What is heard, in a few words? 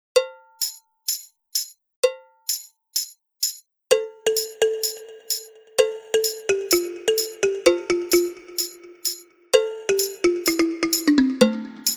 balafon; bannaya